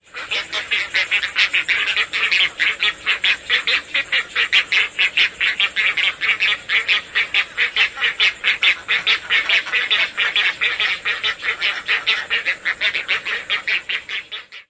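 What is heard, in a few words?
nestling calls, bird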